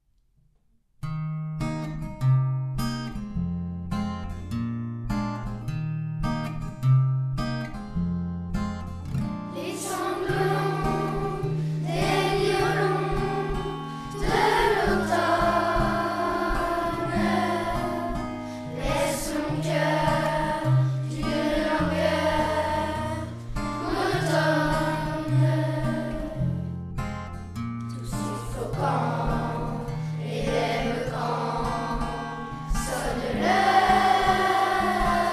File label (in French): Chanson d'automne Enregistrement CM2